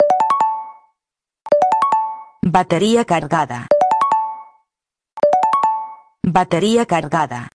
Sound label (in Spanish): bateria cargada